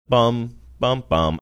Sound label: singing bum bum bum